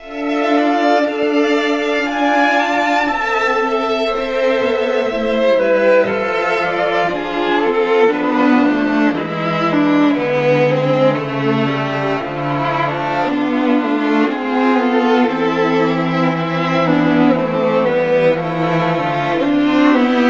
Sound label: Lion Taming Pan Out Fail; Go Play Circus Star; Wii